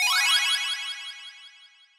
teleport sound

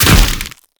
weapon staff spell shot strong explosion

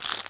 skitter bite

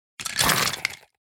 monster skeleton grunt

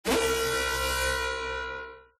ping airstrike

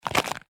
player walk ice loop; footsteps

